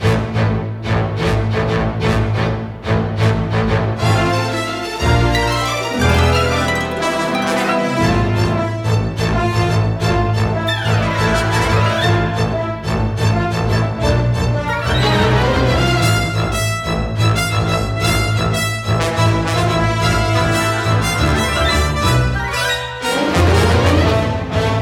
Off Vocal Drum; Koala; Deeeer Simulator

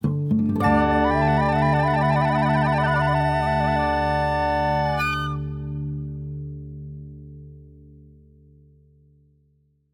Intro Stinger; Orchard

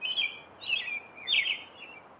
Intro Street; Levels Hub Restaurant; Intro Street Bird Wall

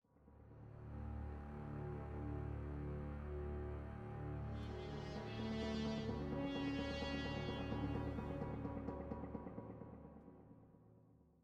Combat Lite Mood

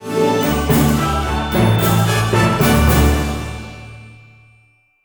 Fireworks Music Silver Chest

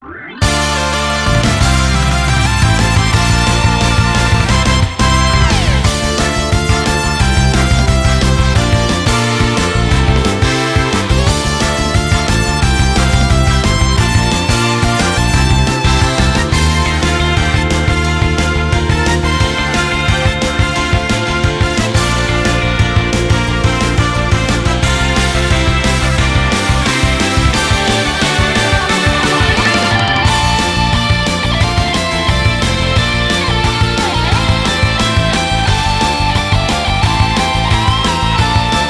Music Menu event

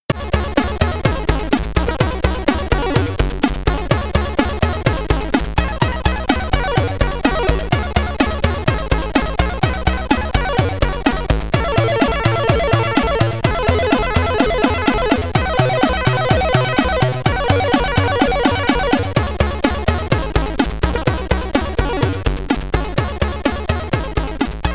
Level 2 (SID Stereo), Commodore 64; Fuzzball

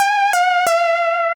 Beat

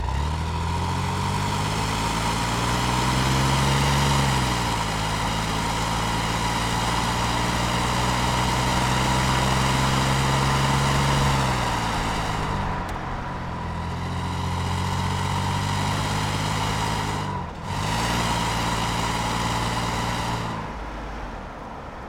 vehicle stationwagon drive; teardown ps5 windows xbox series xs gamerip 2022

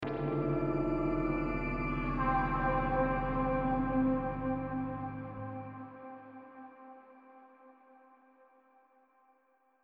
Scifi Outro Low; horizon zero dawn; Bravetrails

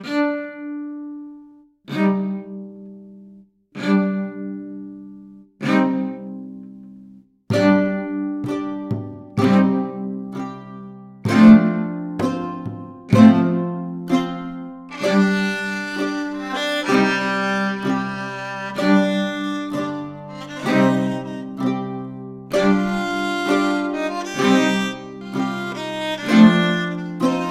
Dungeon - City Catacombs; ashes of the night

